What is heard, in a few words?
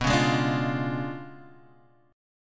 C sharp 7th Flat 9th, chord